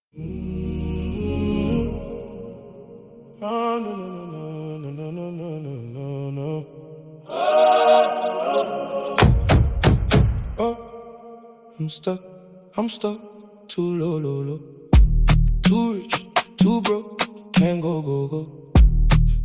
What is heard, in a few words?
Low Afrobeat